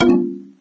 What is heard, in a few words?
permission denied; uisounds; There; ThereClient